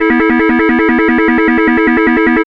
SYN FX; X-Static Goldmine 1

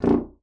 A#0, bassoon; Xsample VOL-6; C BASSOON

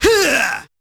G4 Huuaah!, Yamaha PSR-E323 Sound Effect Kit

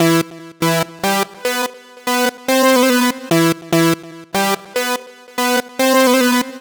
Fat Glide Lead; Sonic Foundry (Sony Creative Software); Club Joints and Street Anthems

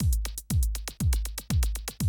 120, Classic Drum Loops

